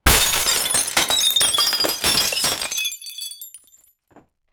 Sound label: a man crashes onto a